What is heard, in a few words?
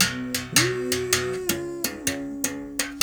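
79 bass berimbau phrase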